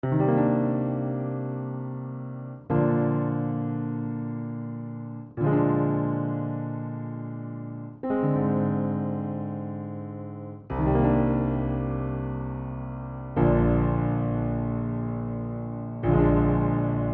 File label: piano chord melody